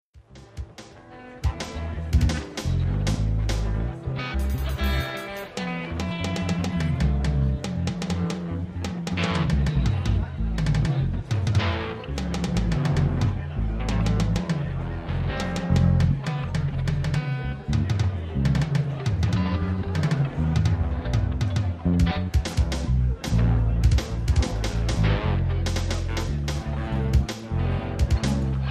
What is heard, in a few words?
Rock band tuning up, with crowd